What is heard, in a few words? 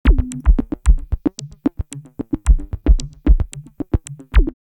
90, drumloops, DrumMachines; X-Static Goldmine